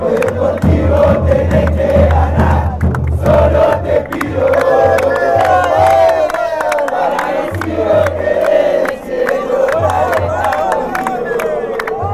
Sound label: chant fanchants; deportivo merlo